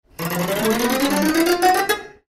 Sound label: mid piano fx strum slide up; archisound